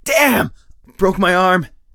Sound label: broke hand; Escape from Tarkov; usec2